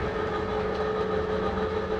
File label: motor, Vehicles; GT6M